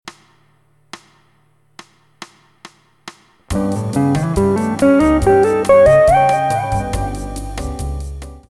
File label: lydian patterns